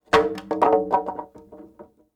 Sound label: wood drop 4 x 4 board tip fall on ground roll; prosoundfx